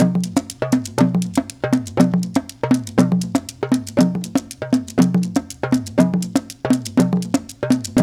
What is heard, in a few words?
JU JU, African Contemporary